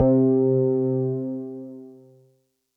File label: Synth Prophet One Shots, Keys C; Electronisounds